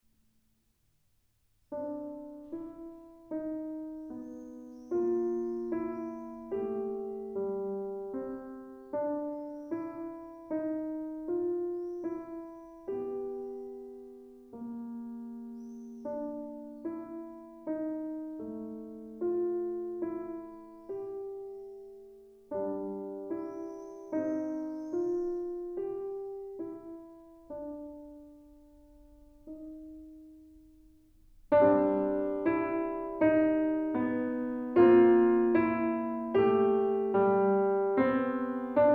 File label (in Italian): Andante Pianoforte; Cinque piccoli pezzi per principianti